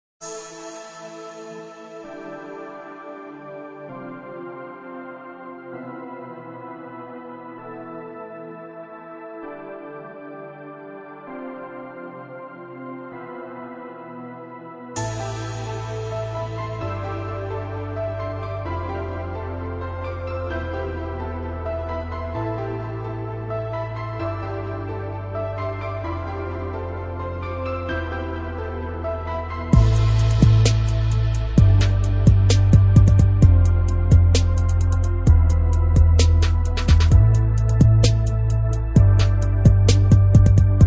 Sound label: TRAP; TRAP SAMPLE PACK VOL.2 BY DDA